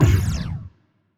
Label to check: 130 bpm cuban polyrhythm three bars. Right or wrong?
Wrong